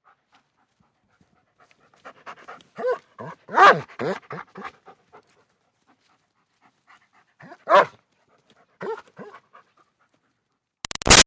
Dog German Shepherd Pants Barks Lite; Dogs; Animals; Big Room Sound

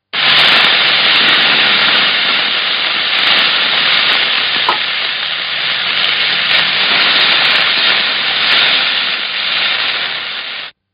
FRY, food prep; Restaurants; Partners In Rhyme

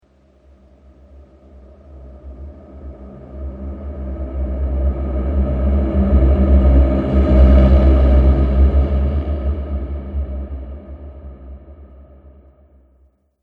Ghost Of The Demon; Ghost Sound Effects; horror

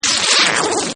Squiggly Monster; Monsters; Horror; Partners In Rhyme

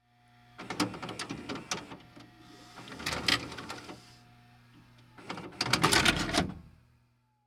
Vcr Eject Tape; Tape Players (Sound Effects:Electronics)

